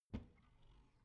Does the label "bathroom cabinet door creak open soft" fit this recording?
Yes